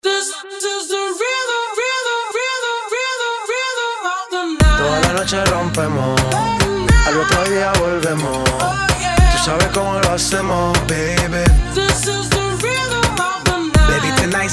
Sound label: BEAT